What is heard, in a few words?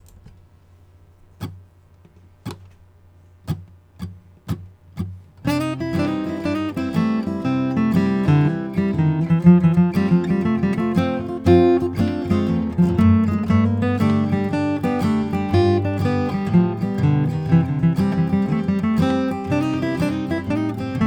Bluegrass Solo Backing Track; Bluegrass Solo 3 Example